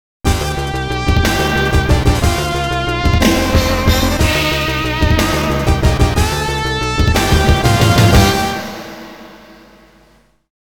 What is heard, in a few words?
Mission Clear; Mission 7 Clear; TAITO SOUND BULLETS (2025)